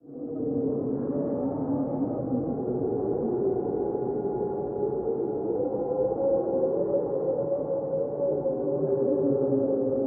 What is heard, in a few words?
ambient fog; STALKER